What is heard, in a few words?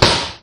hit